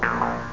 Bass-Funky

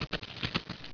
WOLF; THE REALM

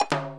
drop wrong; Le manoir hanté

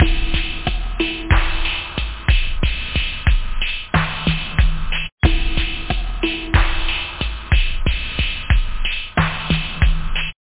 etronic, loop house

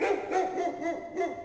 DOG; UrbanChaos

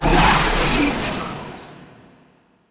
Torpedo; ImpGal2; spacewar weapons

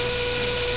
steam blow; Interactive Media Design Review 1999

